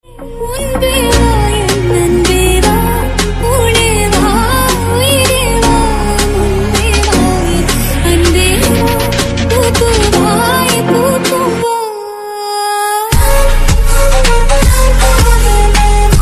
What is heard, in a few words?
remix ringtone dj remix ringtone dj ringtone